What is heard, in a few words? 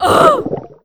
Damage